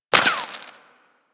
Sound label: Bullet Impact Debris Rock; Warframe; Weapons